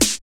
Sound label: SD Dance